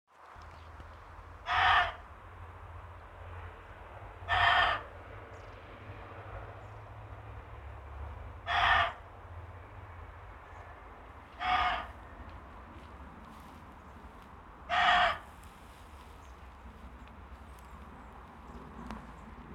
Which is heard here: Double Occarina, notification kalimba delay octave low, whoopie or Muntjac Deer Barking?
Muntjac Deer Barking